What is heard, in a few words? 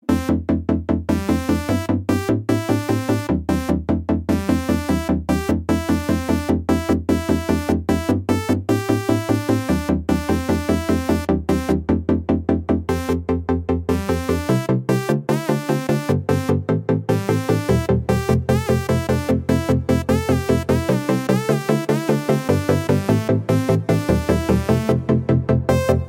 init melody